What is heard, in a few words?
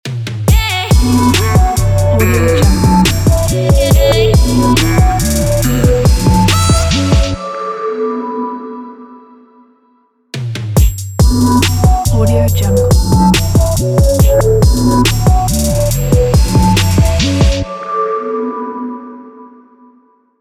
trap hip hop intro